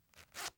Zipper Movement Short; Fairlight Sound Lib

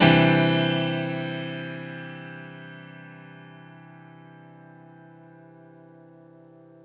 One Shot Piano - 140 BPM (Cadd9), Grand Piano; Instruments; One Shots; Ghosthack; Guitar And Piano Samples

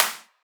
Clap, Psytrance

